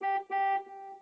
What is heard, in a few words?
horn; cstrike; zm japan